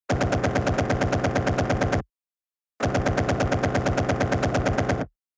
Assault rifle

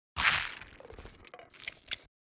bite

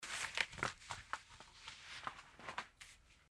Cloth movment

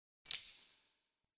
UI moving option to one anoter

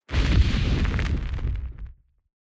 fire

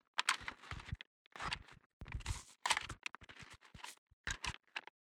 Ranger movement

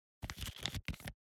MOVMENTS paper

